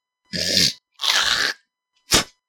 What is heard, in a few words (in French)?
crachat, crap vocal sound for horor games